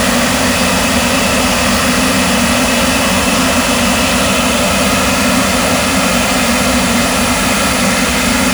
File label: Aircrafts L-39 Starter; DCS